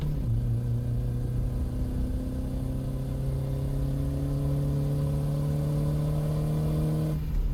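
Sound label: engine high loop; Blind Drive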